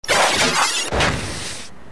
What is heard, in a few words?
Teleport